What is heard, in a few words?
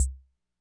Kick Hat, classic machines; arranger